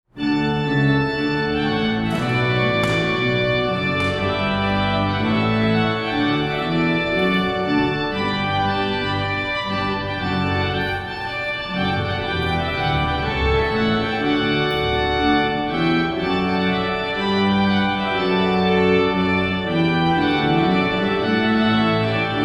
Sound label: Trumpet Voluntary; Stanley